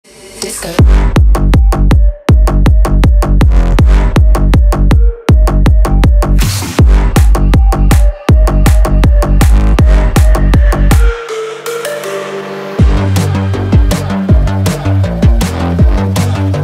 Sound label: disco